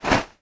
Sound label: foley clothing pile land floor; sound effects one